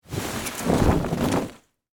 Canvas Tarp Shake, Foley, Canvas, Tarp, Shake; Rustling Sound Effects; Objects

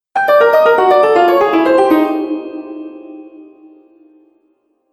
Fantasy Failure Sound; cartoon sound design

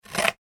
Scissors Cut Cardboard (Single Snip); Scissors Cutting Cardboard ( Single Snip ); Offices

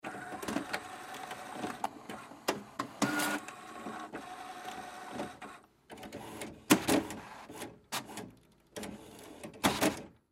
Printer Load Paper, Hewlett Packard; Printers; Electronics; Office; Blastwave

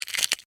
Insect Claw Pinch, Snap, Movement, Bug; Animal; Insect Movement Sound Effects

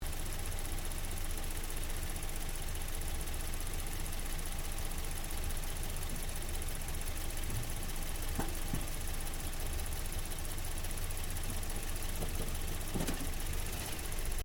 Car Toyota Yaris engine idle loop; Toyota hire car; Cars (Sound Effects:Vehicles)

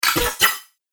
Broken Droid Bits, Robot; Robot Sound Effects

